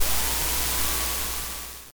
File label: noise gain up